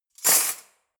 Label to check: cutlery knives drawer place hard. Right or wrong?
Right